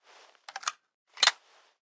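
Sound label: weapon pistol laser jam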